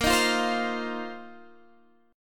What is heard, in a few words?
Bb Suspended 2nd Suspended 4th Bb down Guitar Standard; strummed